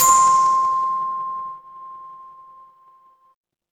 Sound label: instrument chime bar